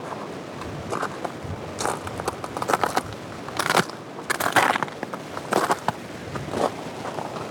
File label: Ice Steps, On The Glacier; outdoor